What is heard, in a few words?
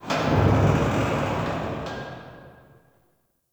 Underground Metal Creak; Ambience; Warframe; Nokko Colony